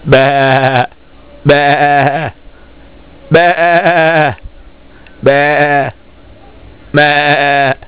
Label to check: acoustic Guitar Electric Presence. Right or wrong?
Wrong